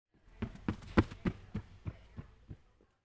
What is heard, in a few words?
girls running